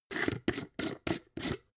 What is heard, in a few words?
jar glass plastic open